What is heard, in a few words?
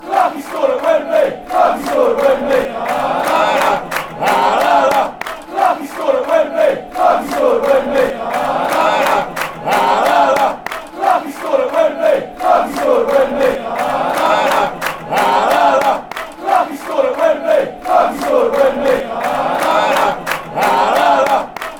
glad we scored at wembley, fanchants